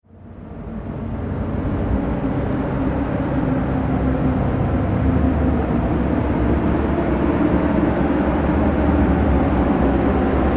Hell On Earth Transition; Transition Sound Effects

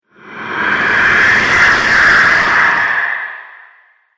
Demon Of Distortion, Monster Sound Effects; horror

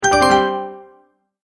Multimedia Tone-Return/Back

